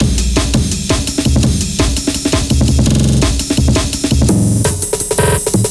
165 Smudge Break, Loops; m8 breaks

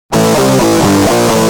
G Jam, Guitar; Industrial

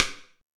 sticks, Percussion; realdrum

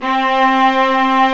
Roland String Master Series Vlas Agitato; Vlas Sect1-2

